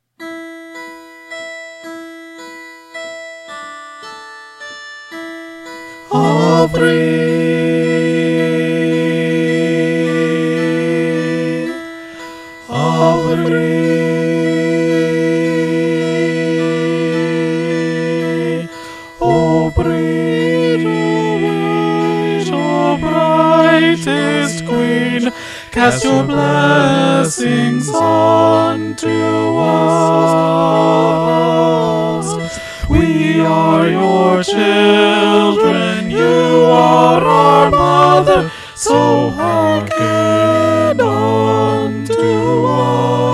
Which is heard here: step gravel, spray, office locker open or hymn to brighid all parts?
hymn to brighid all parts